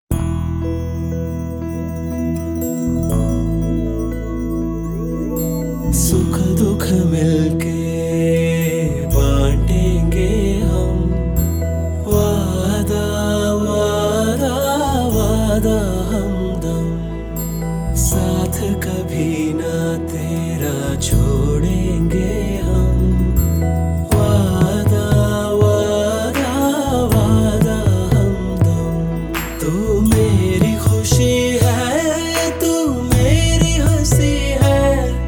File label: (Male Vocals); Kochadaiiyaan (2014)